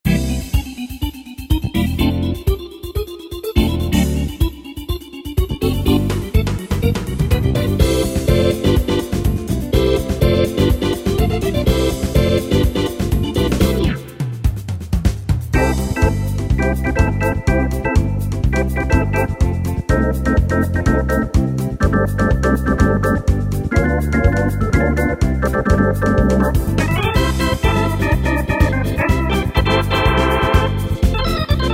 Organ Samba, Yamaha PSR-3000 ballroom style; Factory Styles

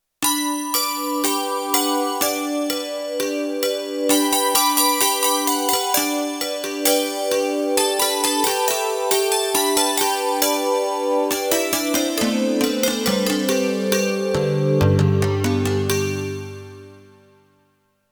Roland JD-800; Factory Patches

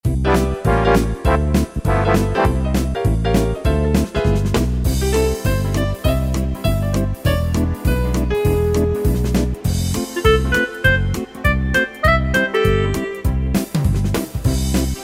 Quickstep, Yamaha PSR-3000 ballroom style; Factory Styles

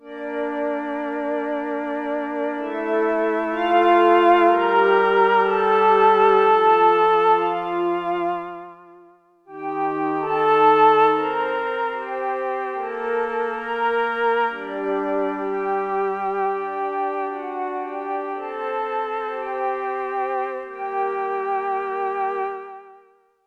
Chorus Slow Flute & Piccolo; Yamaha TX16W

